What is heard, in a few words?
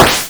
row clear